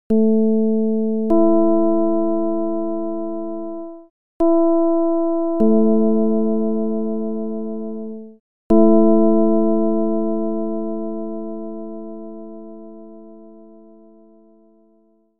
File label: csound foscil 220hz